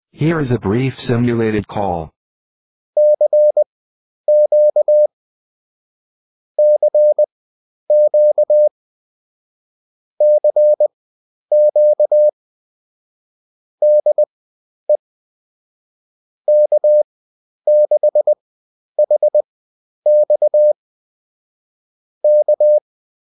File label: morse